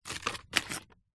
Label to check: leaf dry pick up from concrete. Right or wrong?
Right